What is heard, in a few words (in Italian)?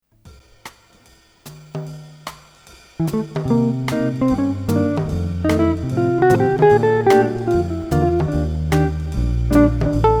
autumn D melodia